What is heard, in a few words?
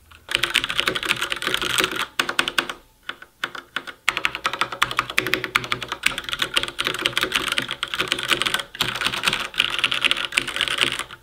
Cherry MX Black